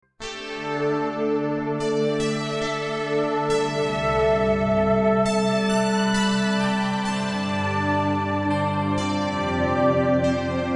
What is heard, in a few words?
pad